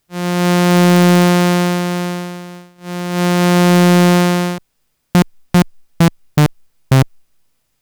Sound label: Polybrute 12 Full Touch Velo